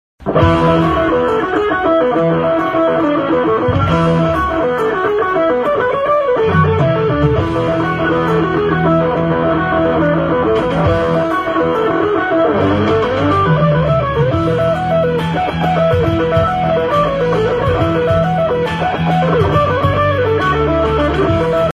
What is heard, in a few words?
guitar; glasgow